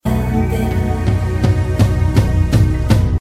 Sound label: drums